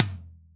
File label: tom, Roland MC-909